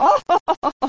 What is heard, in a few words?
laughter